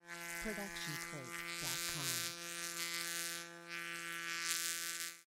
Insects Flying Buzz; SoundsCrate

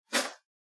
pen write; soundscrate

